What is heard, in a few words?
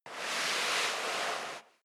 step leather shoes sand slide long; schritte